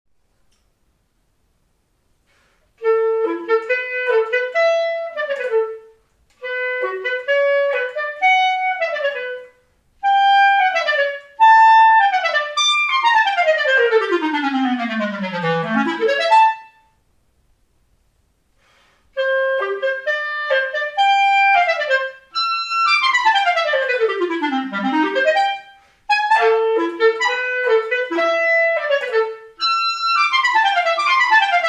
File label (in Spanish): Clarinete y orquesta; CONCIERTO